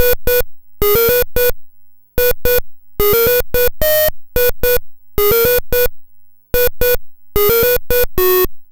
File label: Apple II Synth, Chiptune